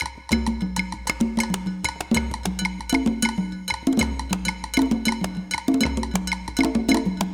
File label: rumba columbia